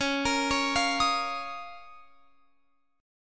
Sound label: Db 6th Add 9th Db down Guitar Open F; Db6add9; arpeggiated